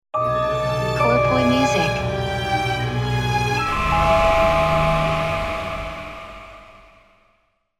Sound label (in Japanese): jingle ウォーター